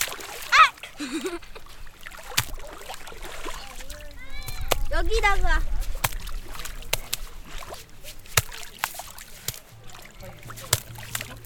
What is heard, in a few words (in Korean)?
모래던지기, beach